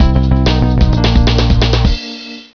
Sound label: Criminal Escaped